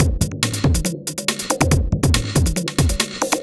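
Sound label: industrial 140 kit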